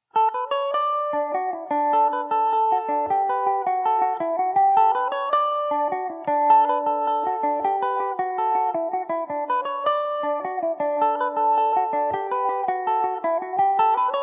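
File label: D major revelation, irish mandolin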